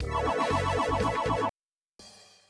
game win

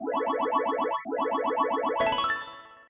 game win

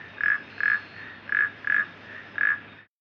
frog

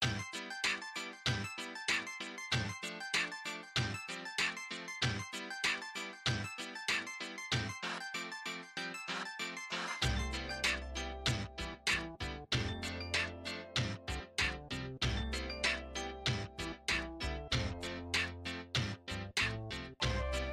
music cheerful; bgm

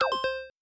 letter click

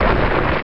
rock explode

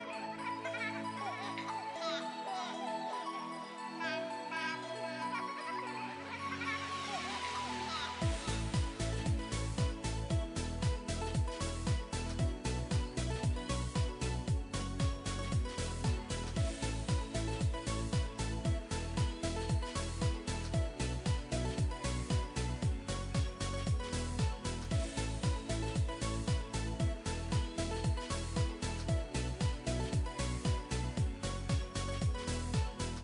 music cheerful; bgm

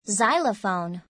xylophone

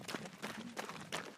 man running

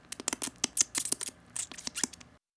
mouse

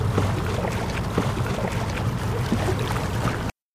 raft effect

